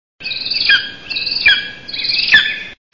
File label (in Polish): orlik krzykliwy, głosy; ptaki